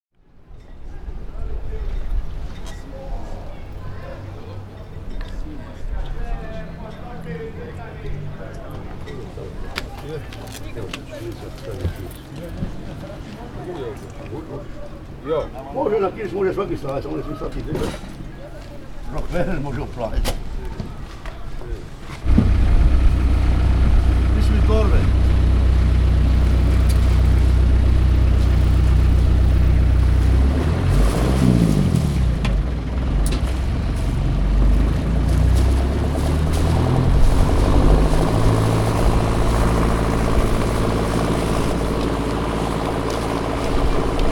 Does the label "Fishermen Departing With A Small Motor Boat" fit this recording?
Yes